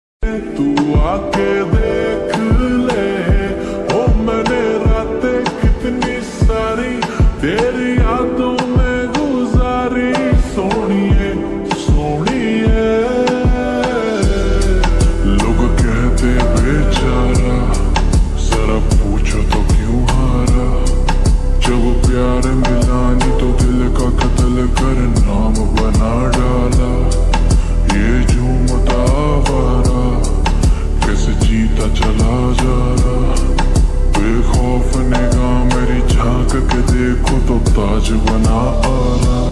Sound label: Ringtone slowed and reverb